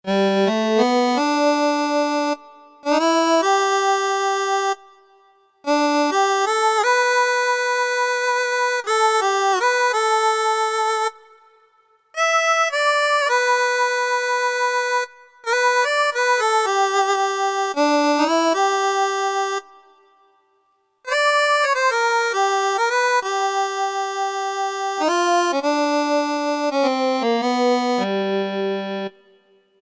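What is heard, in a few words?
organ Mouth Harp; Factory Presets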